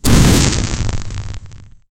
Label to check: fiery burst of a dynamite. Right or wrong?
Right